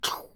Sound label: footsteps dirt